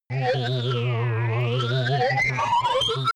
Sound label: crazy scientist, energie